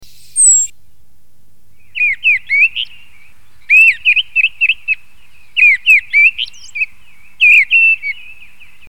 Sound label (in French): draine